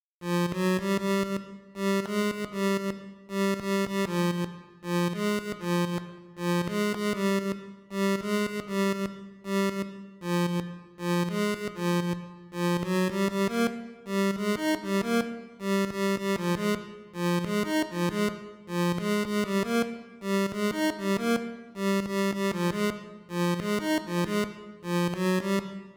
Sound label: Melody; chippy